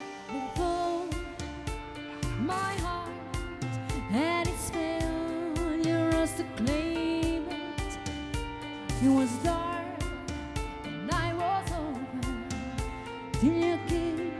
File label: HOUSE